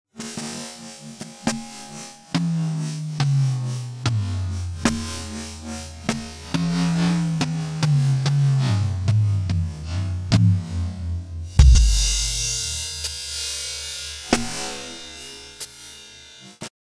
Drum Solo

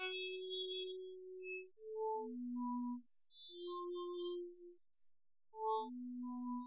Castanets Violin Applause

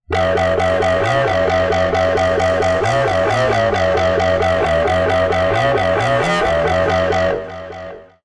Synth Mono; TSMtoolbox

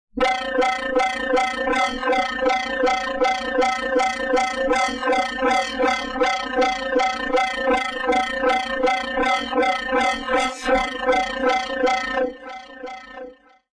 Synth Mono

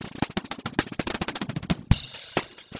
Drum Solo

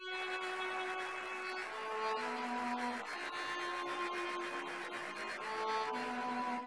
Castanets Violin Applause

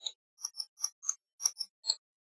Glockenspiel

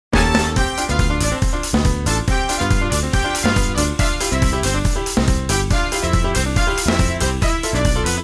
Jazz

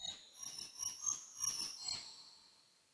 Glockenspiel

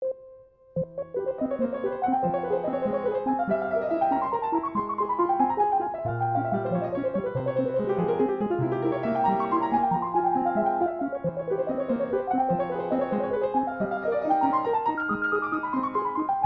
Sync Sine Soni

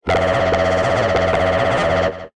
Synth Mono

